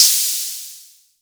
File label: SERGE Hat Open, drums; Polyend; Stazma & Concrete Collage; Concrete Collage Drum Kit; Concrete-Serge Drums